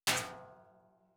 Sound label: Metal Hit; Fantasy Sounds Bundle; Medieval Combat Sounds